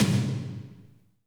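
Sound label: tom drum, right; Best Service; Real Mega Drums VOL-1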